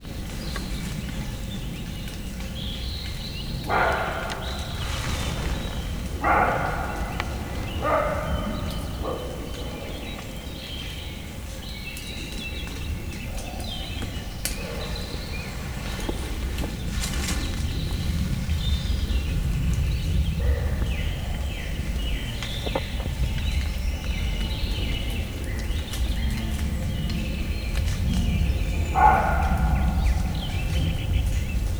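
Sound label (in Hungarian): kétszer, európai őz, Egri turistaház; Egerturistaház; termeszetben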